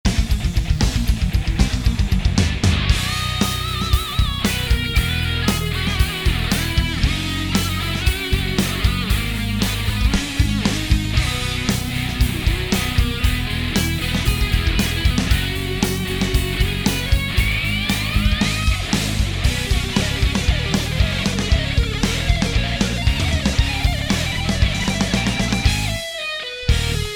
Solo

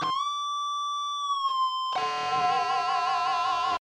tritone double stop

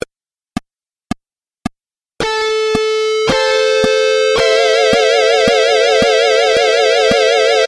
Layered Harmony Example

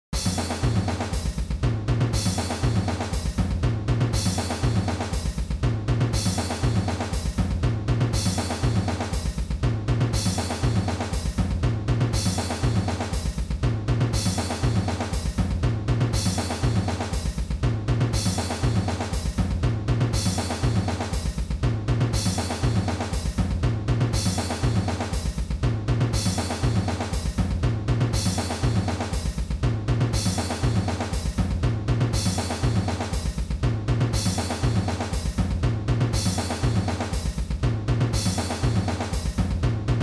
drums; 3against4

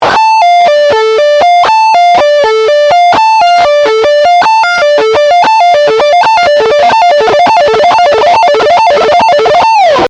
D minor 2nd Inversion 3 string, Sweep Picking

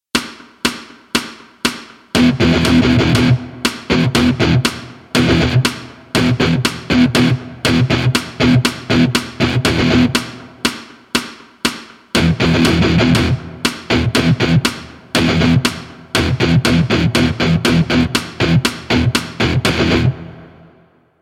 Guitar Riffs Using Just One Note

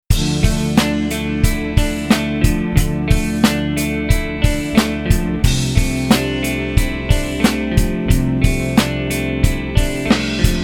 Rock Tracks

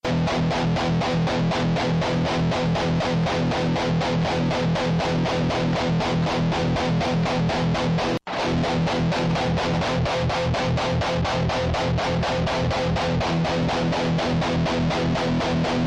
Inconsistent Muting, Rhythm Guitar Playing; Tight Rhythm Guitar Playing

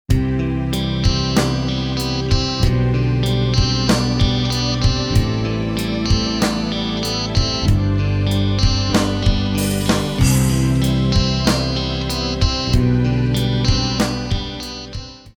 Rock Tracks

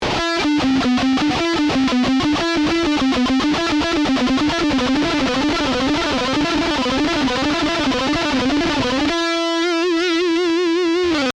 String Change Exercise, Tremolo Picking

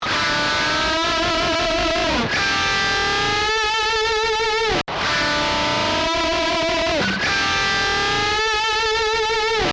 Classic Double Stops

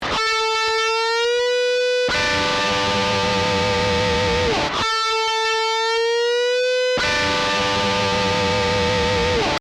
Double Stop

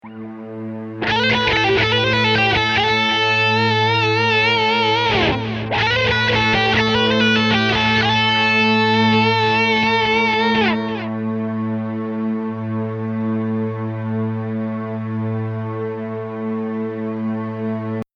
Lick

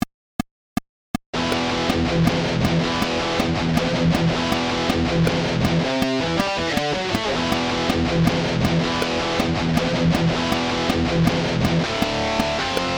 Rhythm

